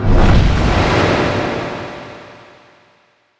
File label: morph scepter; terrorblade